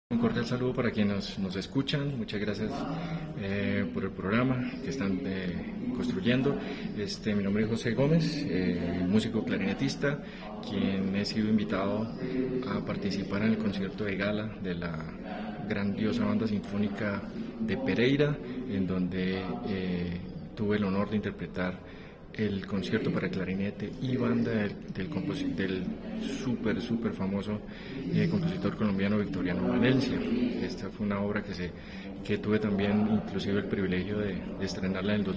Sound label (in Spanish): clarinetista colombiano